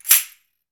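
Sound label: CARNIVAL SHAKER, Latin Shakers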